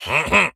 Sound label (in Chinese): 待机声; 卫道士